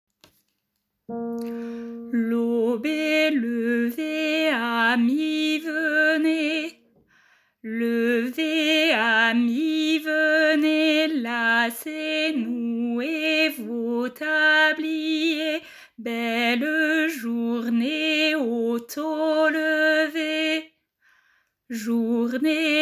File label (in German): Laube alto; chants